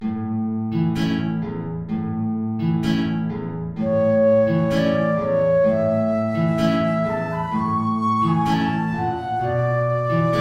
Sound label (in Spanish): tango